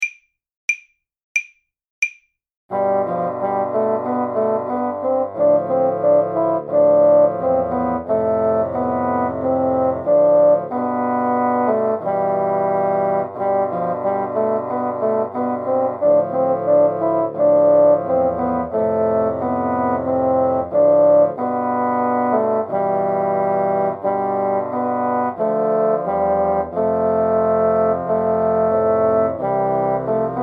ballo 4 voix, basson

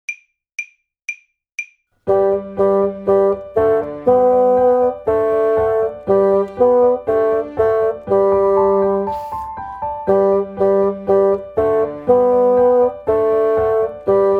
basson

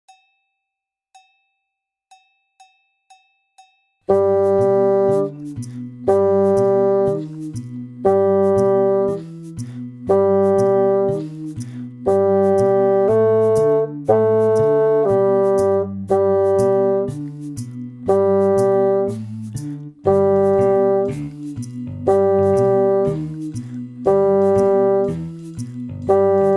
basson

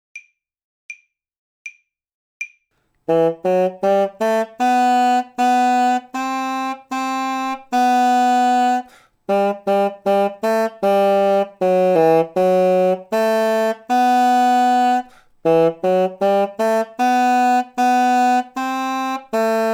promenade, bassons volants duos

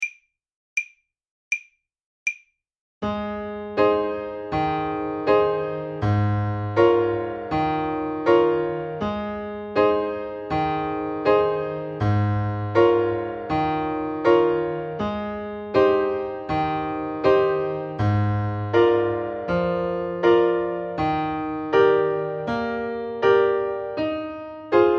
danse clown, saxophone

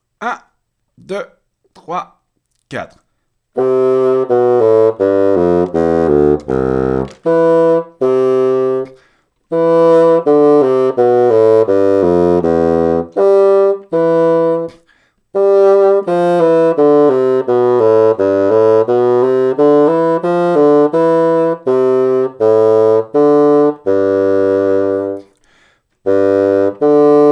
etude, basson

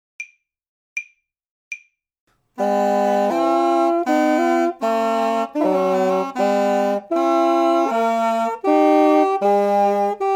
menuet 2 V, basson

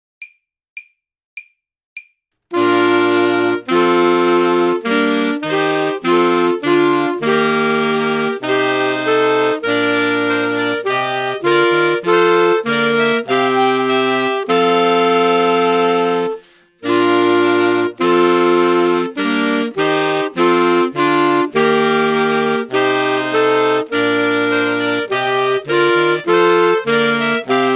air de cour 4 v, saxophone

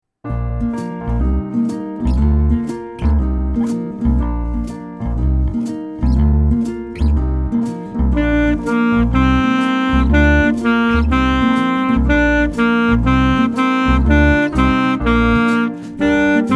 saxophone; mib

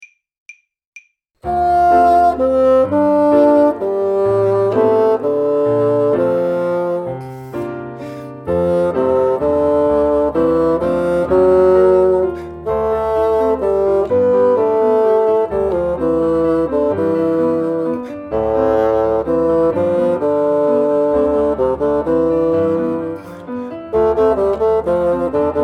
anecdotes 2 basson

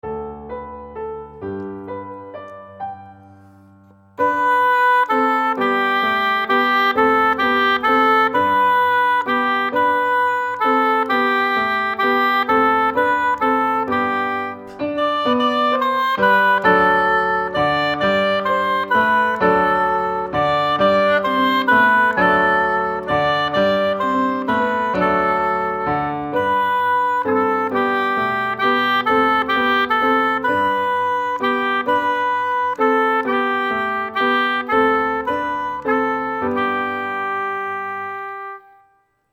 fais dodo hautbois

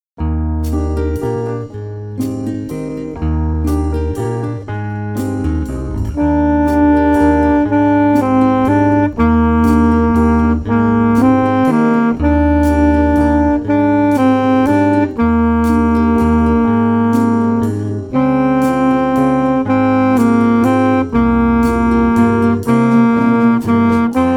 saxophone; mib

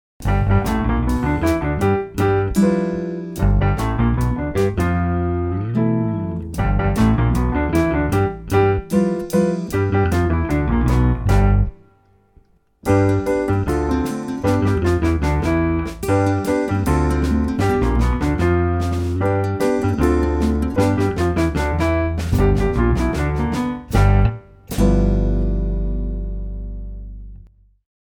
basson

